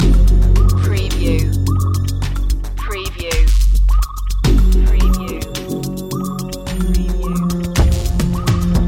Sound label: loop, chill